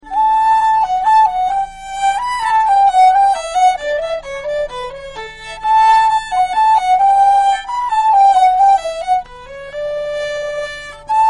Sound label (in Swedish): Vals